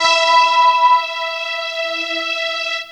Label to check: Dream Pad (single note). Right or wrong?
Right